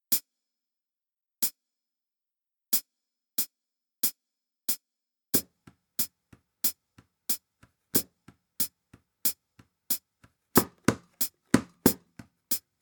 hand to hand percussion

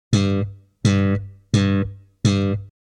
popping

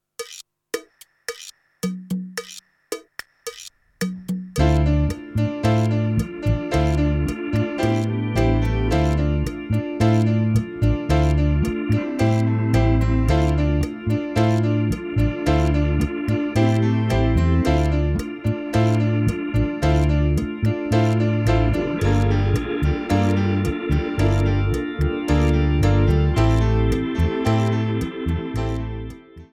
chacha feel

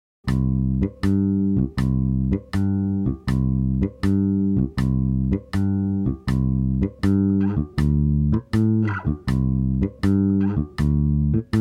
samba

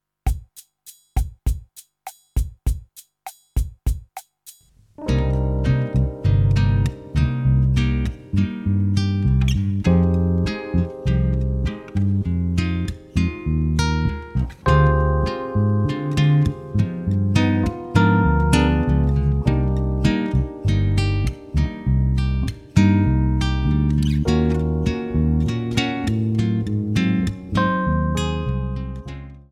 bossa nova

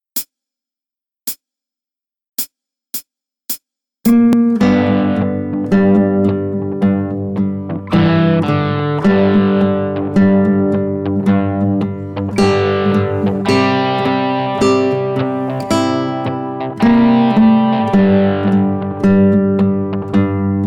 trad blues usa; darling corey

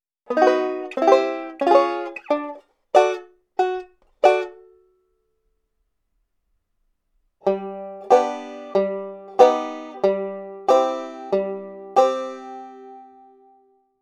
passing chords

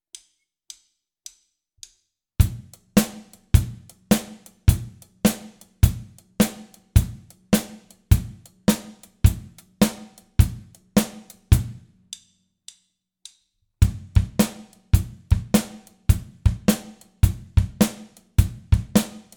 grooves; gerwin eisenhauer

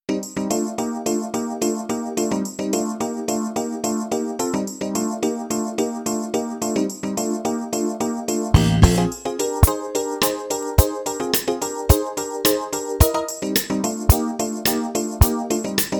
e g blues playalong

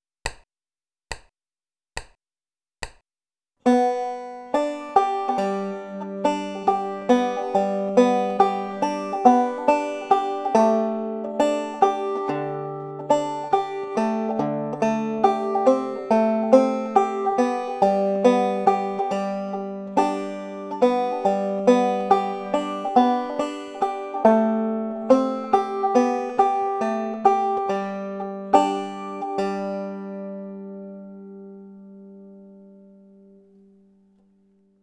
skip to my lou index lead

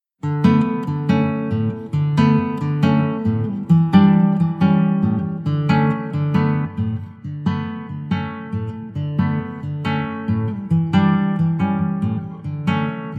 tropical rhythm; maria linnemann

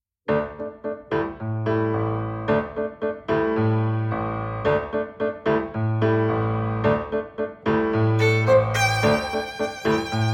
tango duo version

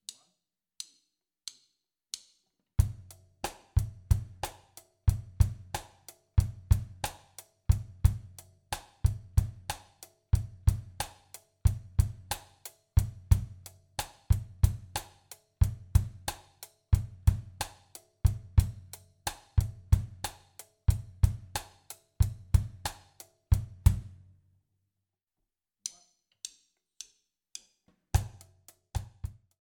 bossa nova

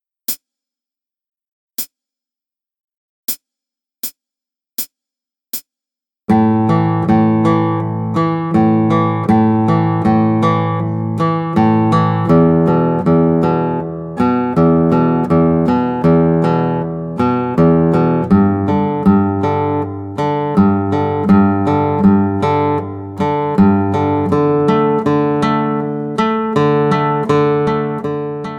power chords

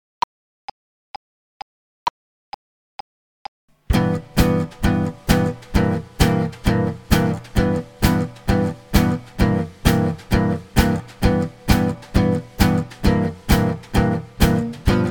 drill in g

